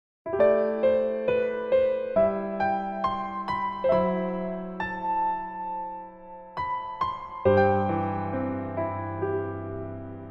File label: Piano ver